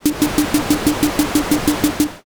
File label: gear